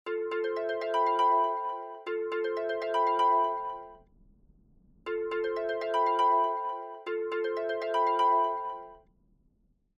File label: ringtone